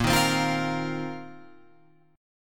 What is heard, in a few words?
A sharp Suspended 2nd Suspended 4th A sharp, Guitar, Open F; strummed; A#sus2sus4; JGuitar